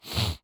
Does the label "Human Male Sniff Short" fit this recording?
Yes